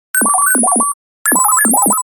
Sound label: Synthesised Computer Bleepery